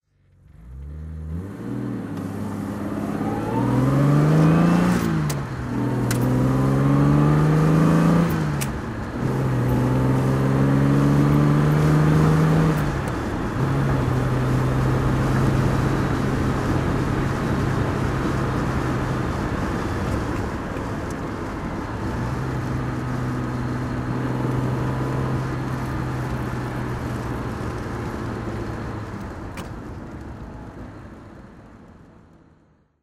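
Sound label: jaguar e type 42 ots drive, Engine sound driving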